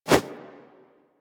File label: Arm Swing Foley; Warframe; Purgatory Warrior